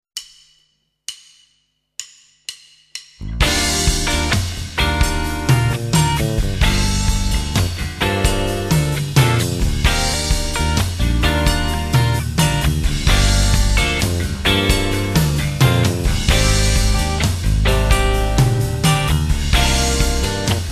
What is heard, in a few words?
Tapping With 8 Fingers Backing Track